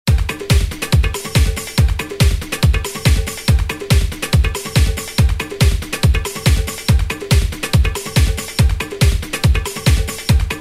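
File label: latin beat 141; Roland M-DC1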